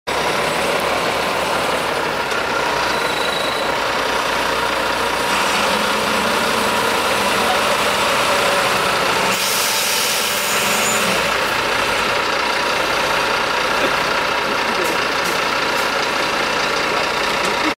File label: Renault Midlum 270 Truck; کامیون رنو میدلان ۲۷۰